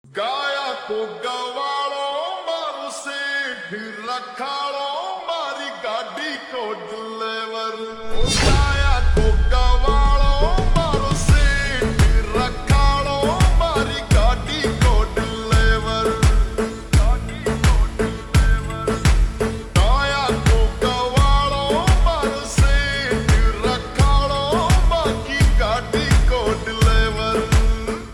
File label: Slow Reverb Version Ringtone